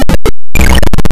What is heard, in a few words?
OPEN DOOR; Dangerous Dave